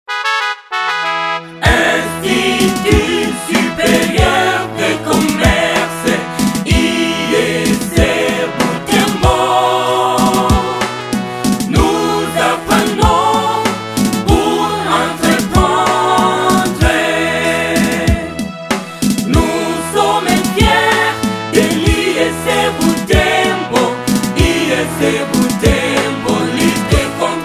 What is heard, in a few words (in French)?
hymne